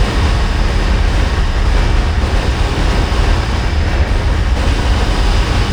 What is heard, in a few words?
machine work; Quake 4 Alpha; id software